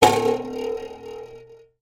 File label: cartoon twang wood stick between guitar strings; zapsplat